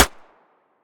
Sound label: hpsa mono distant